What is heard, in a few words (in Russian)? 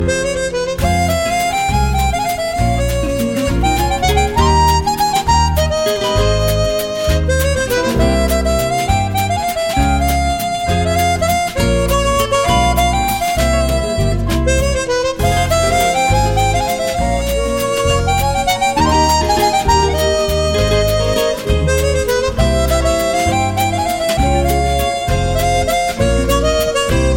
romantika francii priyatnyy motiv akkordeona